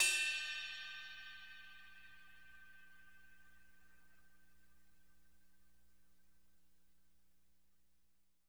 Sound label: CRASH BELL